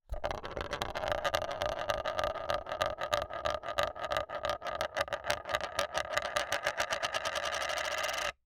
spinning, crescendo, concave mirror, bamboo ring, thick, 3 in diameter, woody, loose, rough; light; quick; Eiravaein Works; Eulerian